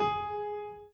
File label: Piano Chord